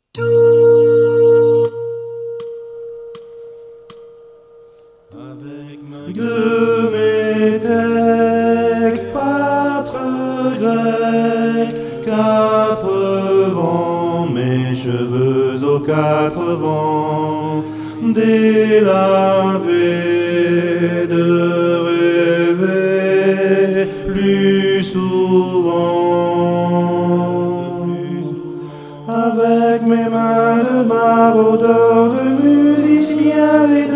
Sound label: soprano, chorale